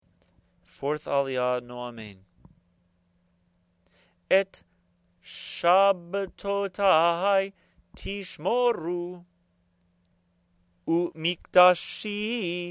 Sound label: Torah Chanted; Kedoshim